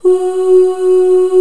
F#4 female

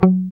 GUI MUTE, E-Gui Muted; Sound & Vision; Gigapack I CD 2

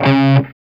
D 4, Soldano rock guitar; Spectrasonics; Hans Zimmer Guitars VOL-1

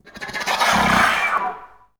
FL FX, Advanced Orchestra

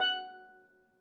f#1, steel, percussion; Giga Samples Collection

